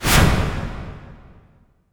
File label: IMP WIPE, Cinematic Impact